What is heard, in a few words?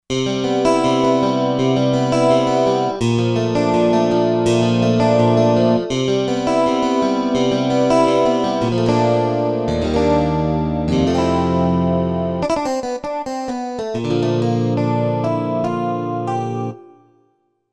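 Guitar Strat 4 Way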